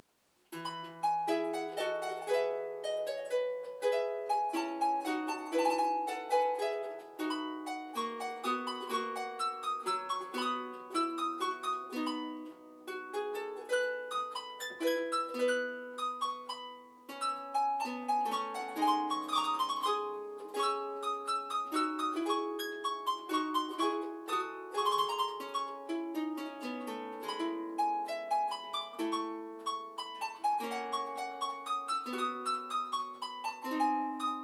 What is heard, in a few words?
harpsichord